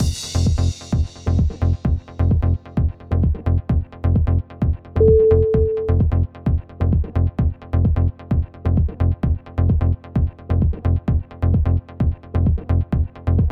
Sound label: trance end